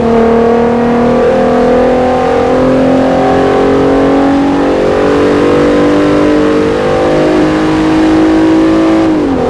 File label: third, vehicles